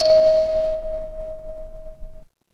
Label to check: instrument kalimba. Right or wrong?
Right